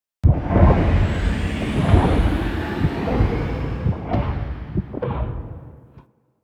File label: Heart Closeup; Deimos Quest